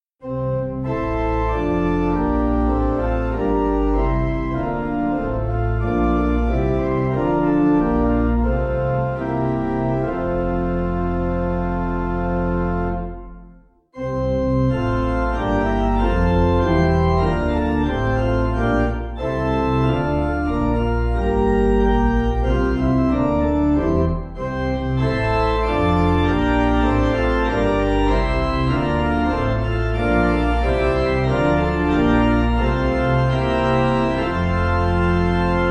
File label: Pipe